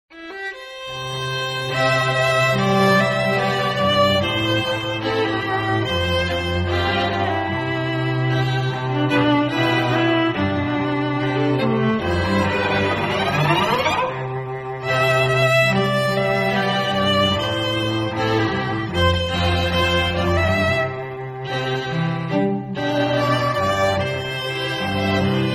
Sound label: stringquartet